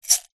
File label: foley gemstones jewels in glass jar shake single